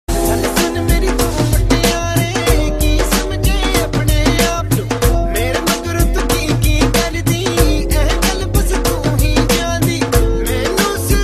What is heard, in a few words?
Dhol Mix